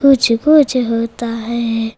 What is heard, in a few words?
CHILD VX C, Indian Traditions